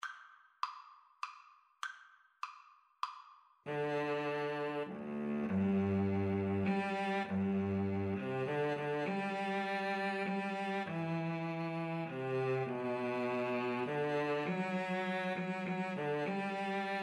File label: i need thee, Violin-Cello Duet